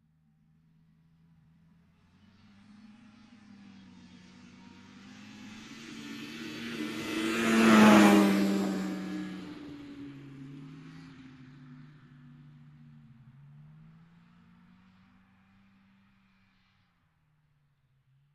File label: Offroad bike passing by